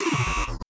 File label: wrong answer